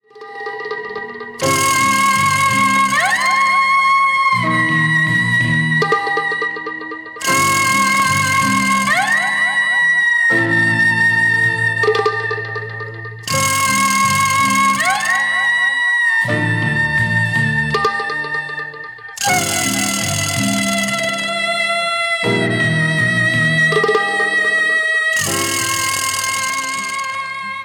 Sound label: rural flesh market instrumental